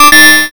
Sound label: correct